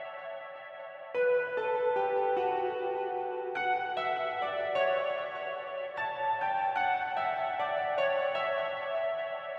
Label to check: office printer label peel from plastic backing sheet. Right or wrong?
Wrong